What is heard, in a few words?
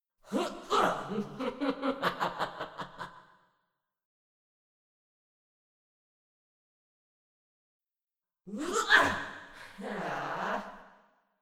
Princess Spawn VOX